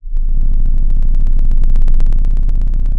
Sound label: pop, Sound of an engine revving